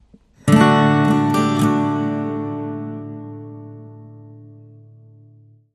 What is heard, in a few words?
Link Sting